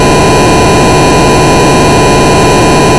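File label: glitch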